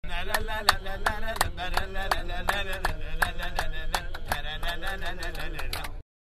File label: loop